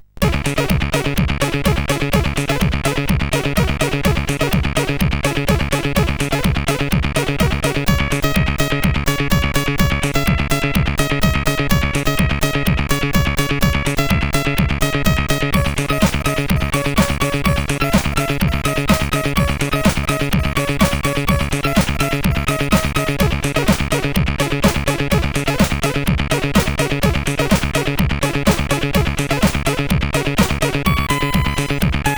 Koto Theme, sid CSG8580R5; Signor

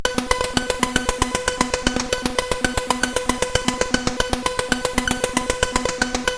Synth Sample III, CSG8580R5

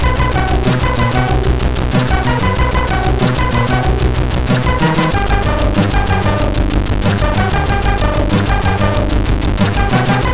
Five Minutes, MOS6581R4

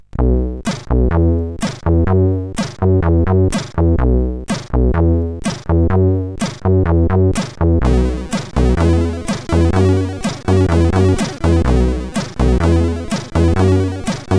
Chaotic Country intro, CSG8580R5; hvsc

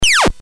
BASIC sid CSG8580R5, GAMES; Terrible Twins

